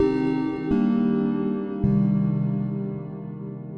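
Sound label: round three tone synth, 127 bpm; SYNTH AND ELECTRONIC LOOPS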